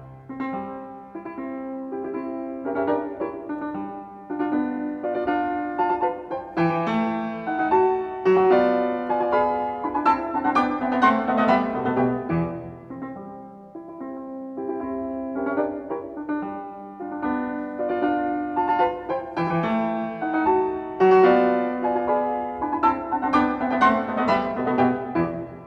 Allegro vivace, C major, piano; Beethoven; Diabelli Variations in C major, op.120; Brilliant Complete Beethoven Edition

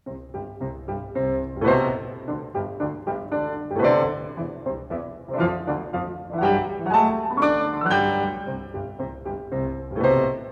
Molto allegro, C major, piano; Beethoven; Diabelli Variations in C major, op.120; Brilliant Complete Beethoven Edition

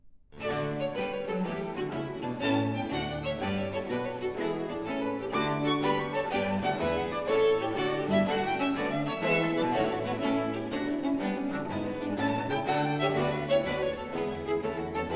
Canon and Gigue in D major - 2. Gigue; Johann Pachelbel; Deutsche Grammophon

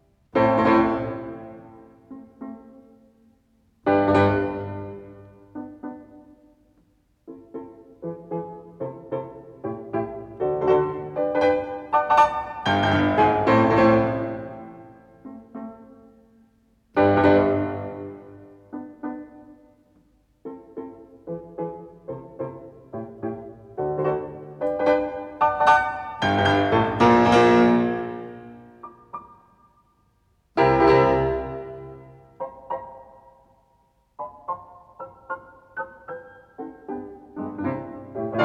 Vivace, C major, piano; Beethoven; Diabelli Variations in C major, op.120; Brilliant Complete Beethoven Edition

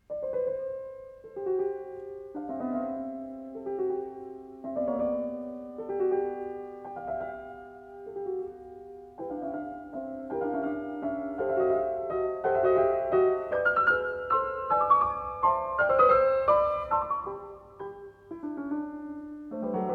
Allegretto, C major, piano; Beethoven; Diabelli Variations in C major, op.120; Brilliant Complete Beethoven Edition

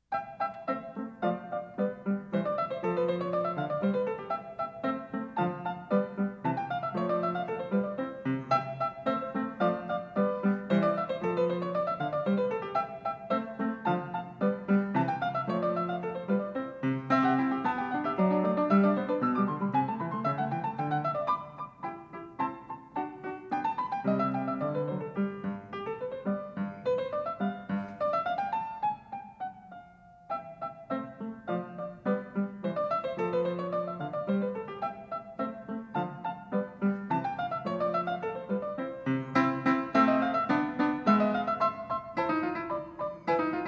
Piano Sonata, Rondo; Glenn Gould; Mozart Piano Sonata No15 In C, K 545

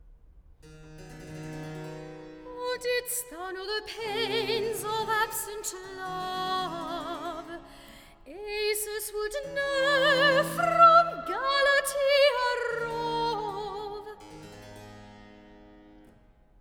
Recitative; Oh didst thou know the pains of absent love; Handel; Acis & galatea

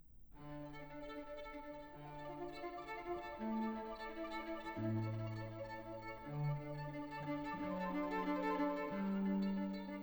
Sonata for Violin and Continuo; Johann Paul von Westhoff; III - Imitazione delle Campane; Deutsche Grammophon

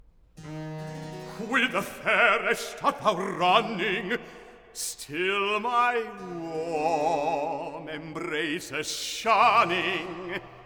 Recitative; Whither fairest art thou running; Handel; Acis & galatea

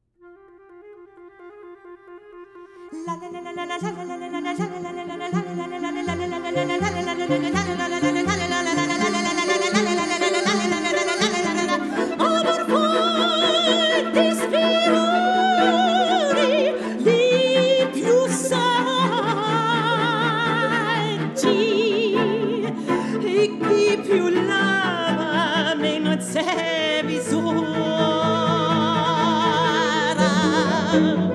Folk Songs - 7. Ballo (Italy); Luciano Berio; Deutsche Grammophon

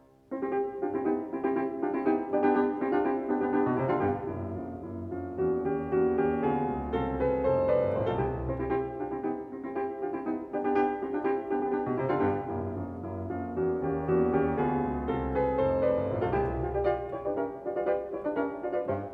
Presto scherzando, C major, piano; Beethoven; Diabelli Variations in C major, op.120; Brilliant Complete Beethoven Edition

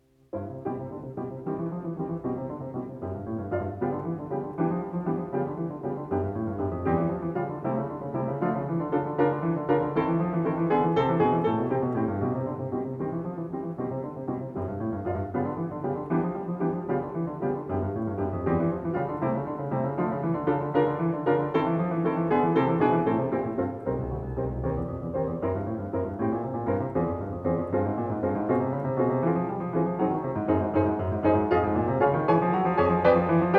Allegro, C major, piano; Beethoven; Diabelli Variations in C major, op.120; Brilliant Complete Beethoven Edition

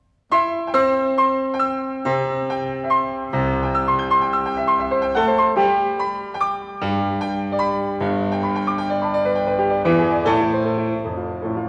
Un poco piu allegro, C major, piano; Beethoven; Diabelli Variations in C major, op.120; Brilliant Complete Beethoven Edition

